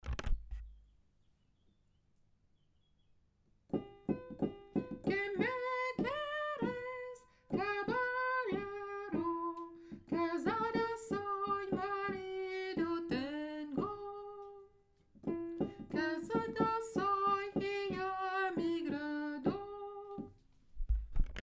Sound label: quem quercis alto; choeur femmes